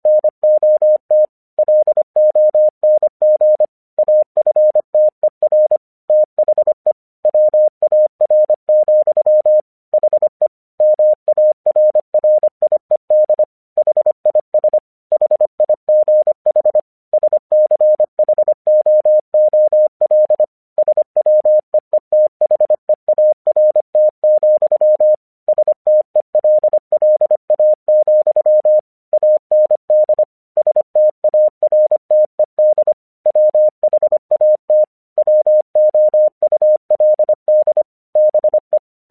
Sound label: English 25wpm